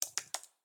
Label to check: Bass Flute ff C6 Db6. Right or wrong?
Wrong